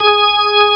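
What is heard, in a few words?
organ, Vintage Keys B-3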